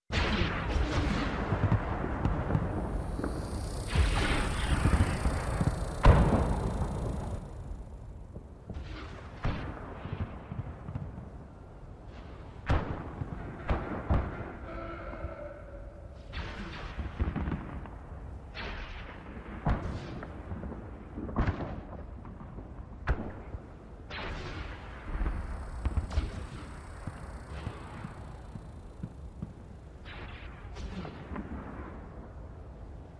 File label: Battle Ambience; 13th Battle of Onderon